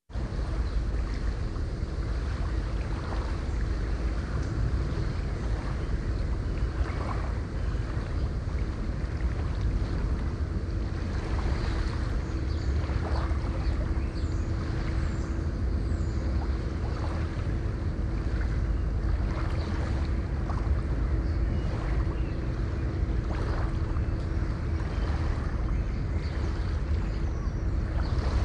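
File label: noise of sea harbour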